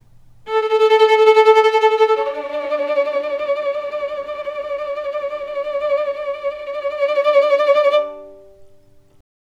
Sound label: slow tense violin playing